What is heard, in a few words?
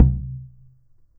CHANG GO, KOREAN DRUMS; AKAI S6000 CD-ROM; WorldSounds